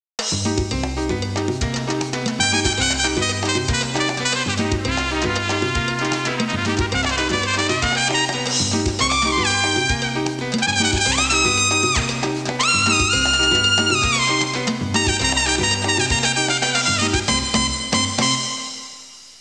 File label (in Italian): musica latina